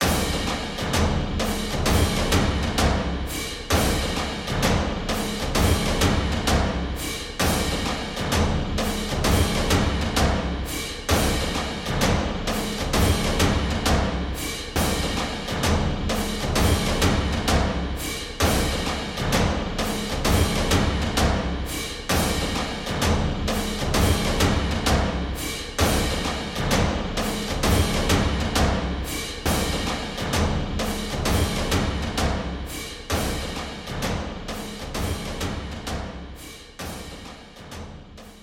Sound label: bgm sea battle comical